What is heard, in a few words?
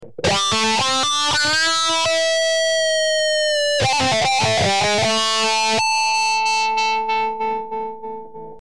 Blues Harmonic, guitar